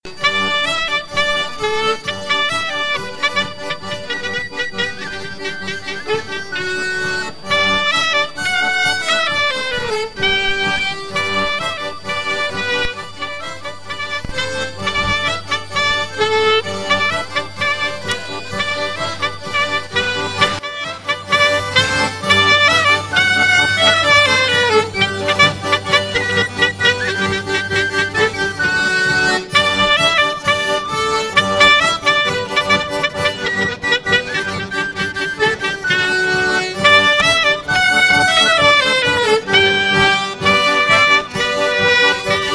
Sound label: Polka